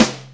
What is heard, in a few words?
SNARE, Skanky Perc; Hip Hop; E-MU Formula 4000 Series Vol. 1; Hip Hop Nation